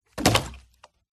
foley bottle plastic full soda water drop or knock over hard surface; zapsplat